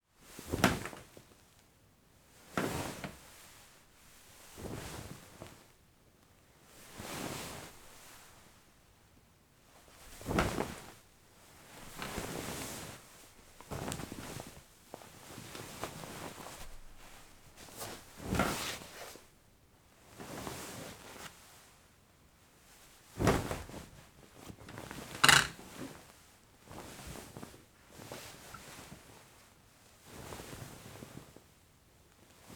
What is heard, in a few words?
Fabric Sitting Down And Standing Up On Bed